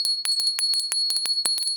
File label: bottleneck bell